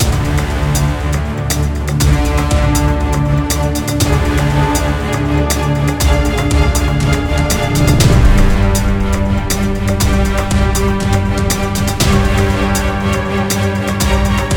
Epic Battle Looped; Dual Blade: Battle of the Female Ninja

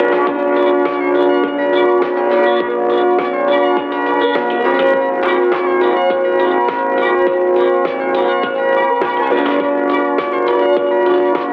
VIP Loop; Space Run

